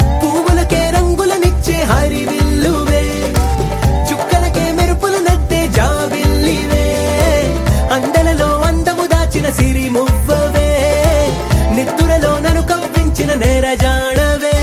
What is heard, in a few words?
Telugu Ringtone